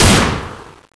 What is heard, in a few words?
gun, weapons; zombiepanic